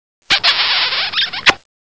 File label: kiss